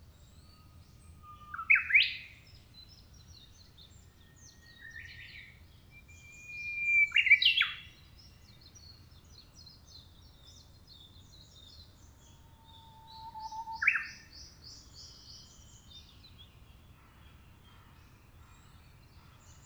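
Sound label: Japanese bush warbler; uguisu; ezosennyu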